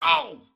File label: hurt